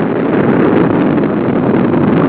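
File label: earthquake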